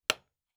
Nail; Fantasy Sounds Bundle; Craft

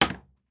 Wood Impact; Fantasy Sounds Bundle; Craft

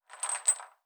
Chain; Fantasy Sounds Bundle; Craft

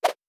Whoosh; Fantasy Sounds Bundle

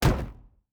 Shield Wood; Fantasy Sounds Bundle; Medieval Combat Sounds

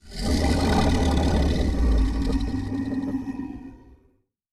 Large Creature Long, Monsters; Fantasy Sounds Bundle; Large Creature 13

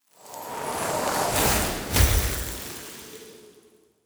Ice Spell, Magic Sounds; Fantasy Sounds Bundle

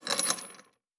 Chain; Fantasy Sounds Bundle; Craft

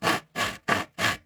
Saw Wood Loop; Fantasy Sounds Bundle; Craft

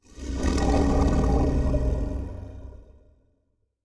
Large Creature Long, Monsters; Fantasy Sounds Bundle; Large Creature 13

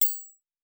Jewel UI; Fantasy Interface; Fantasy Sounds Bundle

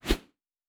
Whoosh; Fantasy Sounds Bundle

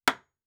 Nail; Fantasy Sounds Bundle; Craft

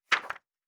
Book Page; Fantasy Sounds Bundle

